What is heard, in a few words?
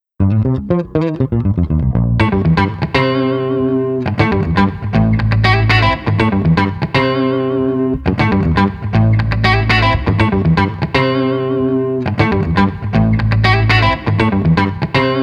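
Street Guitars Funky Chase no Drums, 120 bpm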